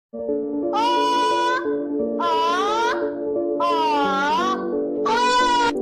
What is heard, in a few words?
ooaaaa